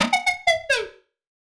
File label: BASS SHOT